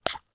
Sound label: food spoon in glass bowl cereal wet; zapsplat